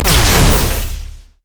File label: crit attack; LoL; velkoz skin01